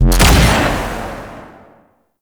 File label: Alt Shot, pistol; Crp BE Plinx; Blastor Pistol